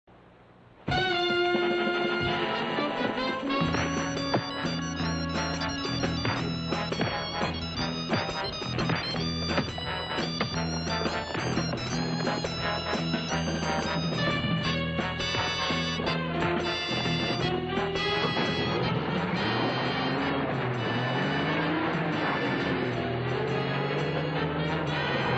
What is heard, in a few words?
music